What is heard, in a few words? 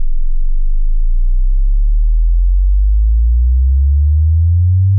sweep 20 to 100 Hz; Various Strange Sounds and Effects